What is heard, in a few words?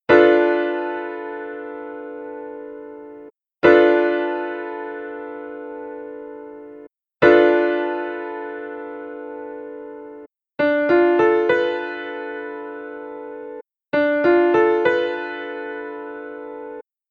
Dm6 Piano Chord